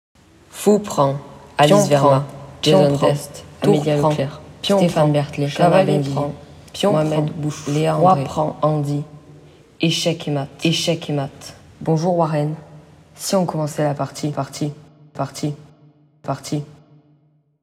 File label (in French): générique de fin